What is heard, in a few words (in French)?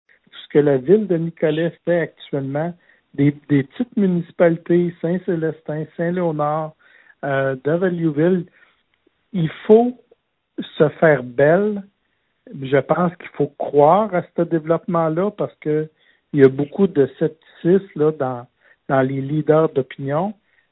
batterie